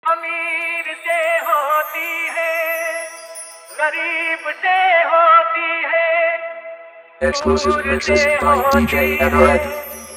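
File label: Old is Gold Full Dhollki Wedding Dance Mix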